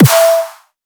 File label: pan snare